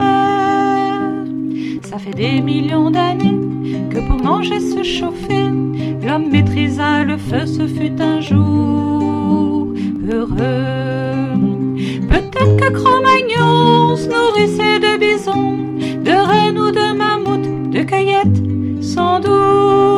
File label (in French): chant